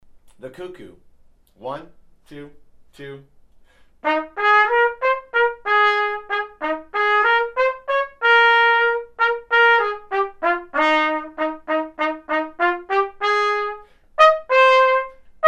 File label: Trumpet